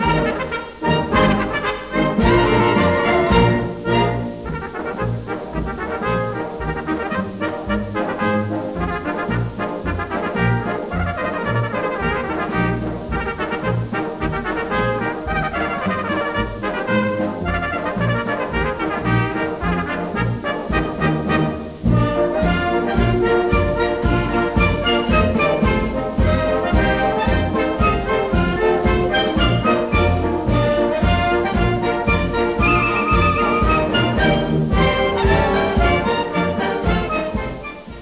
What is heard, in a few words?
2 trumpet, cornband